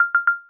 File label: drip flat